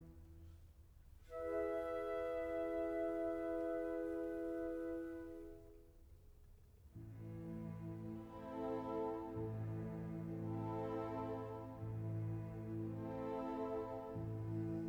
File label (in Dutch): sopraan